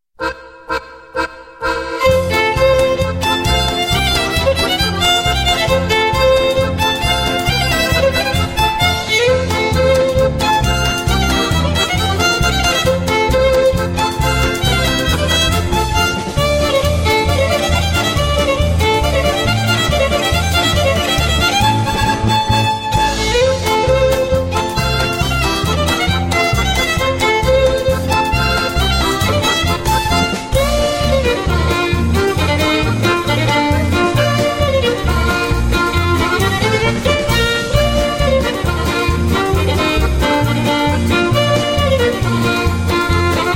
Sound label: Polish Polka